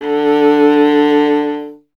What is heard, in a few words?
viola D0, Solo Instruments; Viola 2; Miroslav Vitous